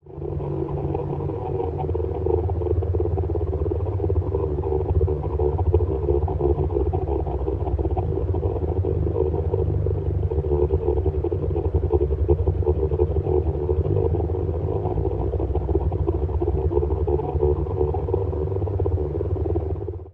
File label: engine hum, engines